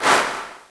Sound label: drum